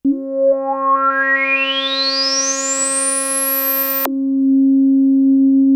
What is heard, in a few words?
ARP 2600 C4, synthesizer; Hollywood Edge - Giorgio Moroder Rare Synthesizer Collection